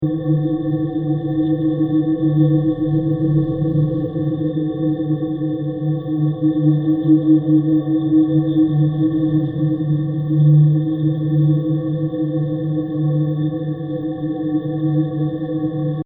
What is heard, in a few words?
Mysterious Ambience 120bpm; Scary Soundscape Sound Effects; Scary Soundscapes (Sound Effects:Horror)